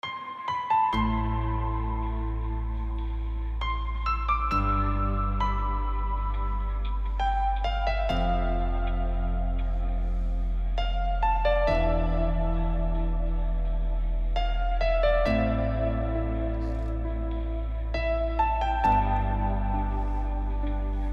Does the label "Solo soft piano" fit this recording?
Yes